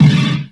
DIRTY SD; Kawai r-50; Drums

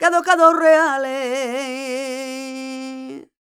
voc c, Flamenco, Vocals, Female Cantaora

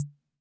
Ace Tone Rhythm Ace Perc, Drum Machines; 1967

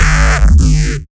Reese; neuro; BIG5AUDIO

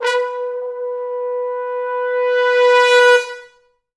Pop Brass; Best Service ProSamples vol.19; AKAI

